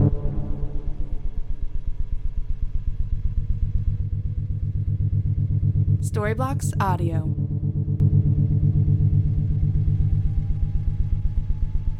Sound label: Ambient House Trumpet Drone 120BPM C